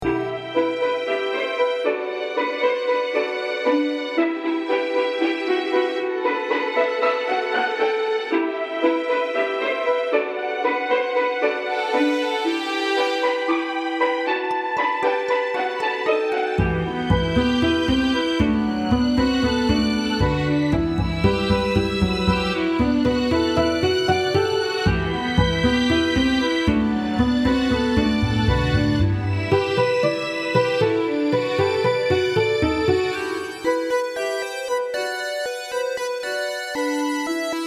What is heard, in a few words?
title theme loop